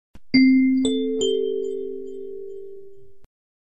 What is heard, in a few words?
Bing Sms Short